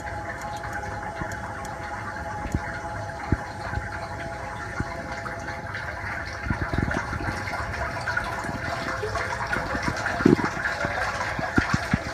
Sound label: water